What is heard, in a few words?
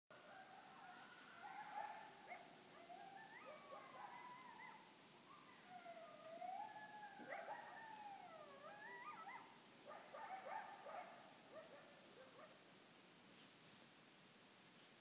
Coyotes